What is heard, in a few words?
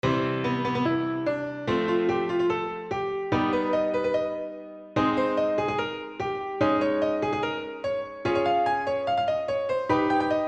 augmented modulation test; example of my augmented chord method to modulate by a major third